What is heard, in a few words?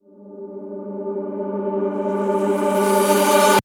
Riser; MP Set 06 Unstoppable